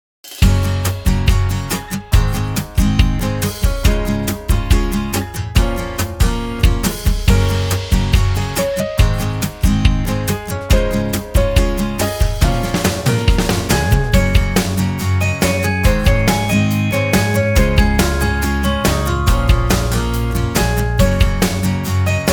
欢快的背景音乐; 3分以内

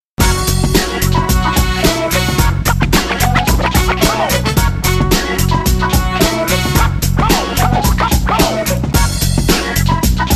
片头音乐

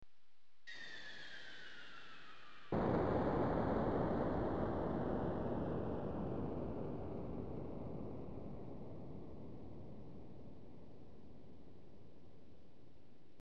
片头音乐

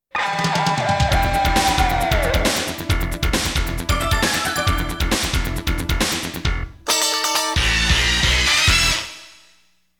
激情的背景音乐

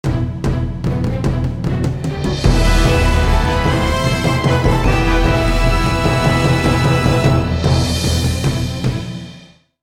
大气的背景音乐

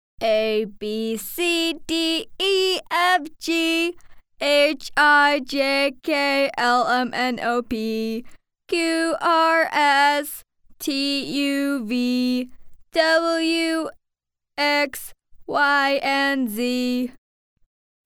外籍女英 外语 美式英语 ABC歌男童

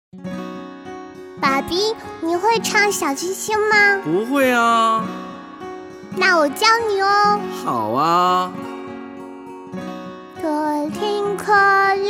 歌曲翻唱 儿童声歌唱

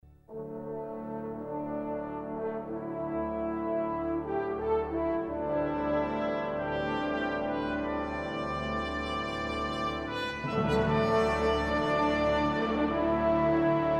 豪迈的背景音乐

